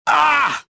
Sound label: pain; Star Wars Jedi Knight II Jedi Outcast; XBOX; lando